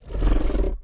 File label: lurk, Angry Chimera Growls; TBs Angry Chimera Growls